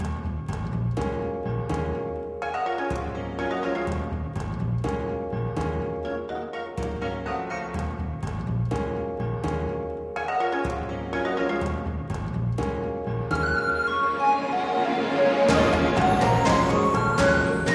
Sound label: Dark Rainforest; Indivisible